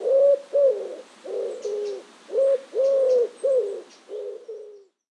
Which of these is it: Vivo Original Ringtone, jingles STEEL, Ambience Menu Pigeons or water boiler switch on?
Ambience Menu Pigeons